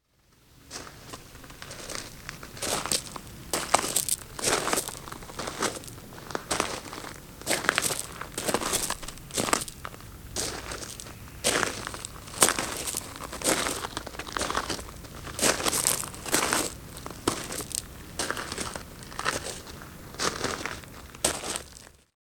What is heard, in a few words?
Footsteps Large Gravel Slow